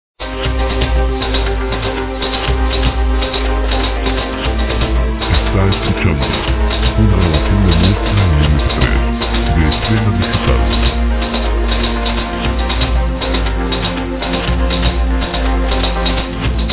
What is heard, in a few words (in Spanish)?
andamios documentales; musicalibredederechos musica television